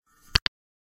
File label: Snap, Alerts; Nokia; Windows Phone apps